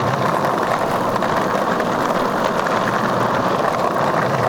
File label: skateboard roll; smite; loki